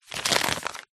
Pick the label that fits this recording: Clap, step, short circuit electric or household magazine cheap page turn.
household magazine cheap page turn